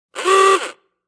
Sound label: Old Klaxon Car Horn, antique horns